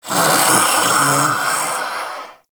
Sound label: Armadillo Vocal Snore; Warframe; Characters; Hunting Critters